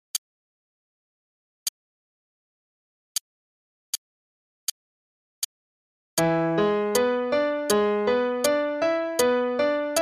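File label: Broken V7 chords up and down (A Major, quarter note=80), V7, root position & inversions, broken, up first, then down; CM Level 5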